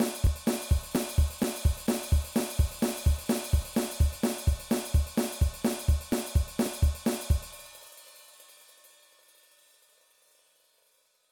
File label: Rock Drum Beat